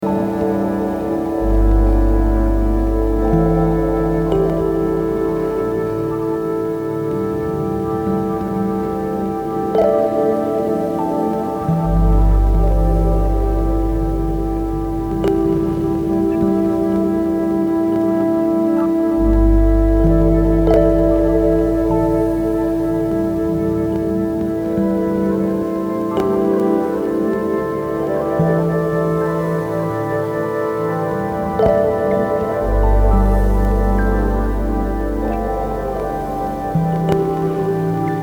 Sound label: alto